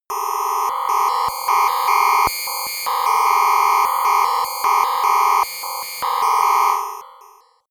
atmosphere on off

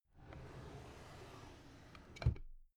drawer cabinet carton close soft

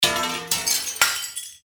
glass breaking

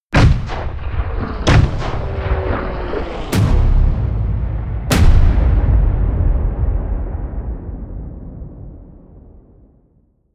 air strike

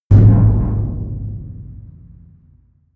big metal impact low long

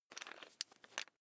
electric drill bit fasten wrench turn fast

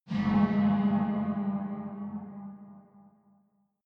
tunnel horror metallic

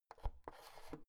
oven knob press in soft

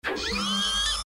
servo noise

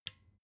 desk lamp on soft

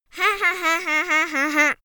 little girl laugh fake

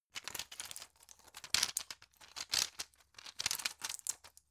plastic blister handle long

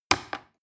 plastic button little click heavy slow

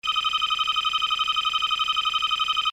title running layer signal

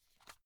watch case small open quick

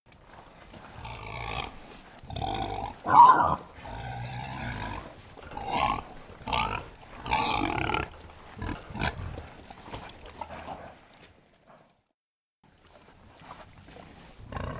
pig herd grunt, pig

